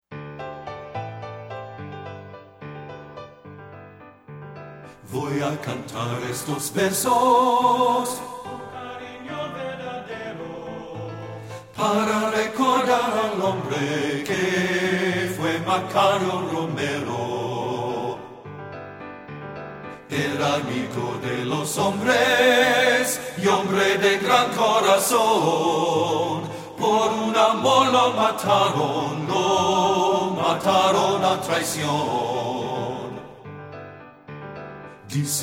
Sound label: BASS; men